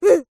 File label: human child boy 8 english grunt